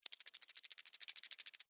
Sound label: Spider Walk Move, Spider, Walk, Movement, Arachnid, Insect, Bug; Spiders; Animals:Insects